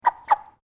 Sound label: Bird Chicken Koeyoshi Clucking Double, Chickens in northern Japan; Birds (Sound Effects:Animals)